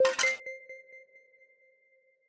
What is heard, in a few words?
Powerup Fake Coin Trigger